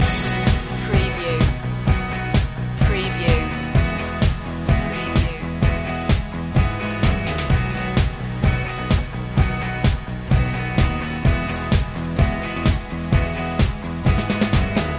loop, Upbeat, guitar driven pop track. Catchy guitar melodies over a driv; pop-rock